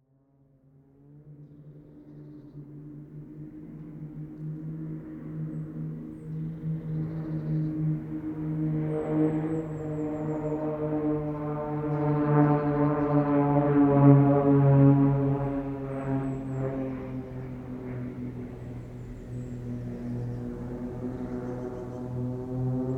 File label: aircraft extra flugzeugbau ea300 x4 airshow acrobatics close by